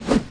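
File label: weapon axe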